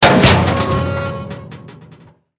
metal barrel; World of Tanks Blitz

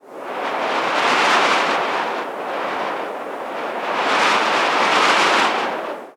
ambient battle; World Of Warships

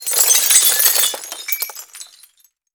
glass window pane breakout; Explosions

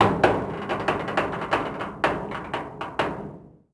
stress metal; ambient canal

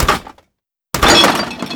refrig bump; Call of Duty Modern Warfare

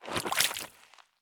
stop puddle; Escape from Tarkov

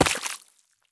sprint puddle; Escape from Tarkov

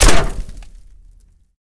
bullet hit outside; War Thunder

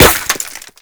impact masonry; Modern Warfare

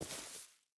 footstep rubber boots grass walk; Rust

